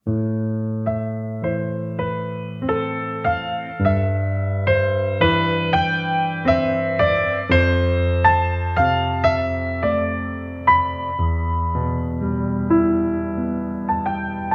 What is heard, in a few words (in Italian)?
pianoforte solo